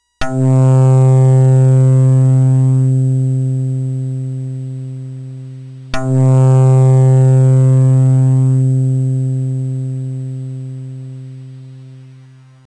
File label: string